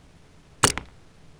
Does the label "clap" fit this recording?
Yes